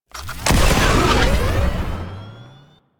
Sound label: Hoverboard Boost Fast; Warframe; DE; Vehicles; Venus